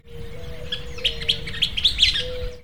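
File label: Western Kingbird; Hasty CG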